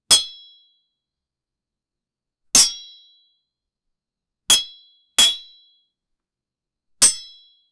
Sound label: sword clang